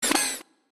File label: Enemy Arm; kritter krawler